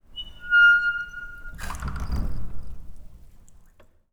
Wooden Door Creak; 99Sounds; The Warehouse

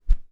gore - metal knife swing; Timothy McHugh; Gorification [HD]

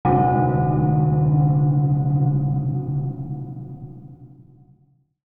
Tech, Weird Side Samples

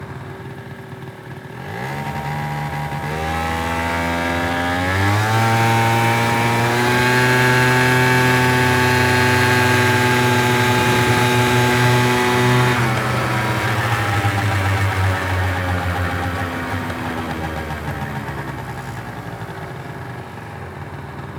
Scooter Driving Short, Piaggio Sfera 50cc; ’94 Piaggio Sfera 50cc; SoundBits; Scooter Sfx

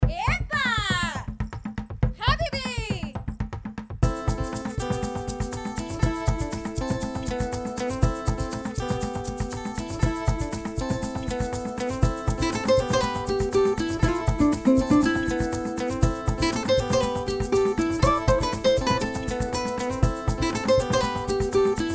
Punchline, World Fusion

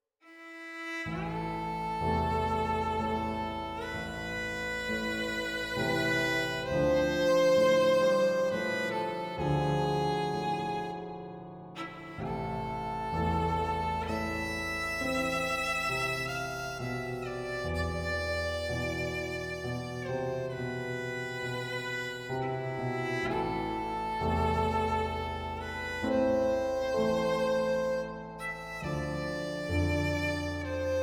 Violin and Piano; Orchestral

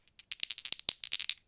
die Shuffle, Casino; Kenney's Sound Pack

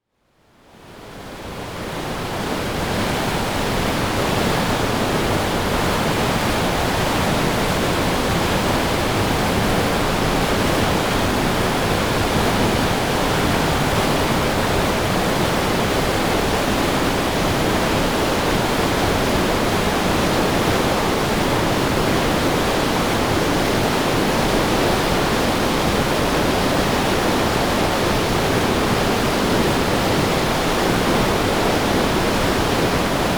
Rivers And Streams, Weir; Rivers Streams Water; BBC Sound Effects Library

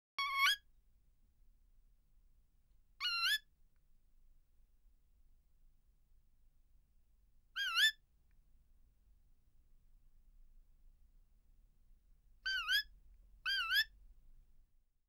Animals, Kookaburra; BBC Sound Effects Library

